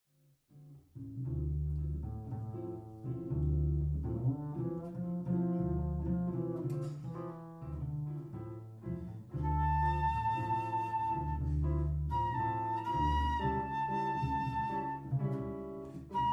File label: jazz